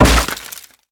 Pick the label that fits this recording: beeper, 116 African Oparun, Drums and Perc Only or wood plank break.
wood plank break